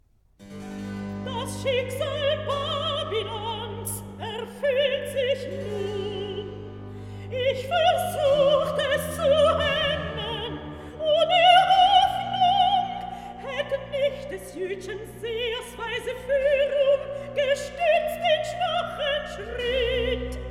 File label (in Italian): Recitativo; Nitocris, Daniel; Das Schicksal Babylons; Handel Masterworks; Klassika